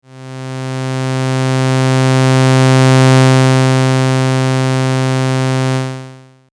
adsr

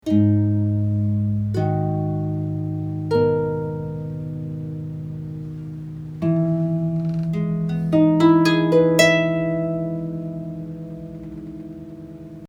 Dry Harp

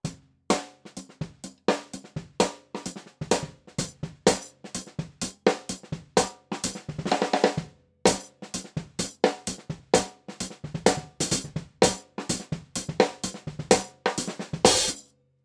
HI HAT BEFORE

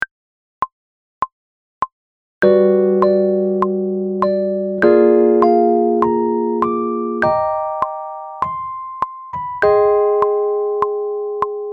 heres that chords

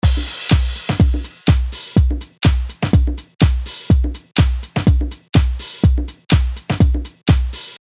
drums

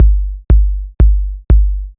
kick

